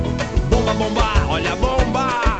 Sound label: nade